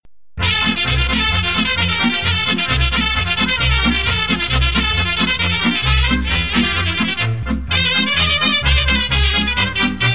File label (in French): Ardeleana, Roumanie